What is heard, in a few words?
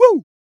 Vocal Oneshots; Vengeance Samples; Vocals